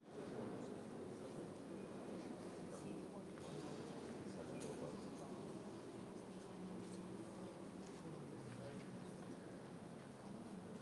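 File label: cathedral belgium; sonniss gdc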